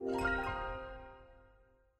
pickup crystal

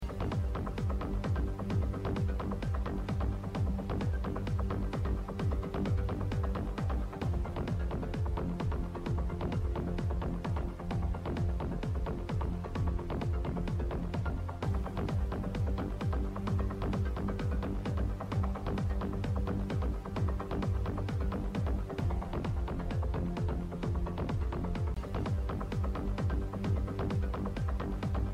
bgm background; powerball

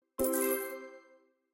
upgrader success

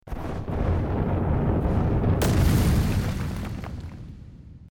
sound loading; hoyoverse

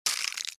freeze intro; ThreeHeadedDragon

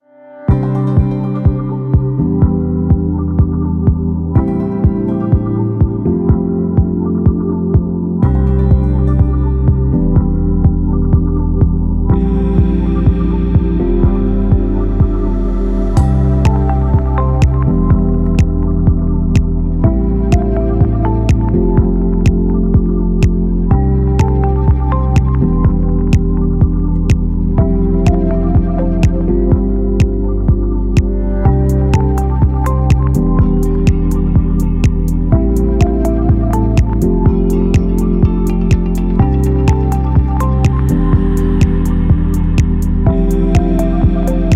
background music

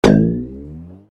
impact; go diego go; safari

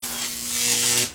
electricity

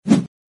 swoosh

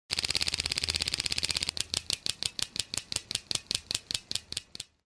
roulete audio

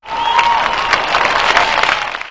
crowd human score

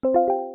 chat

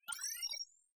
main menu hover

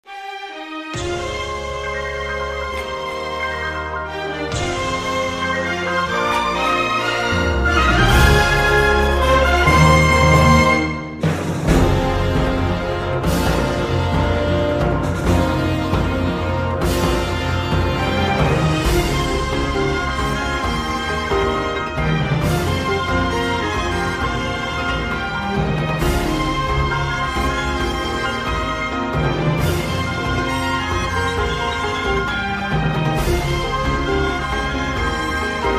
music

